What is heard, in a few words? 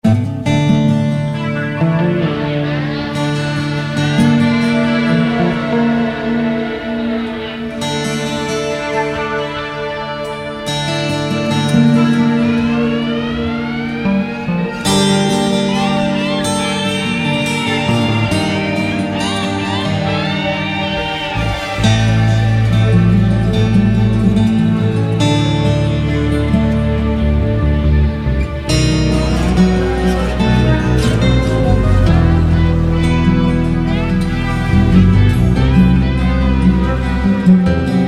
Tristram Guitar (Orchestra); Diablo Anniversary